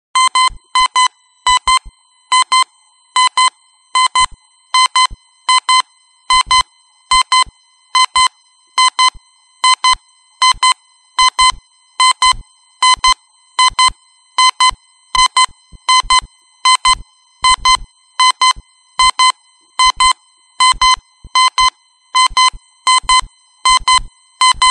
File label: Beeps Alarm Electron